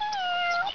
CAT; 特酷声音素材库

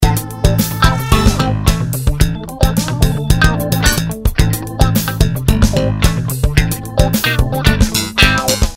loop

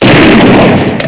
fire Gun; flash MX 2004 Game Programming

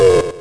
Game, 游戏音效; 特酷声音素材库

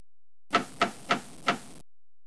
door

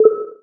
Button, 按钮; 特酷声音素材库

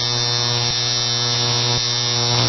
Neon; 暴力赛车

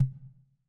soft beep button lo; 18 button and menu sounds

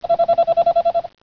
PHONE, 电话; 特酷声音素材库

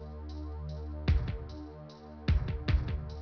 Music, 片头音乐; 特酷声音素材库

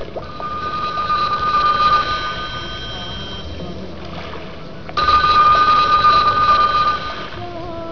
PHONE, 电话; 特酷声音素材库

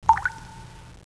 Water, 自然, 水声; 特酷声音素材库

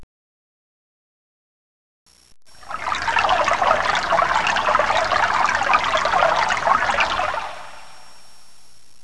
Water, 自然, 水声; 特酷声音素材库

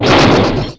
Game, 游戏音效; 特酷声音素材库

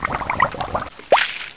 Water, 自然, 水声; 特酷声音素材库

